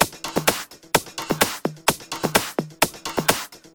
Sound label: Loop, 128 BPM